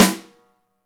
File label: snare, Roland; Club-50 - Foundations Roland; Ext.Snare